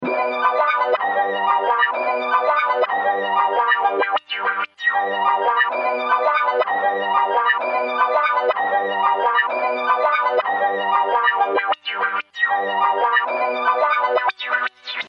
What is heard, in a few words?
scary 127bpm